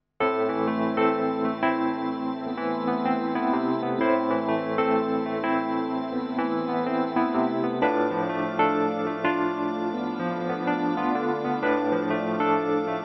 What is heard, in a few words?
wurly pop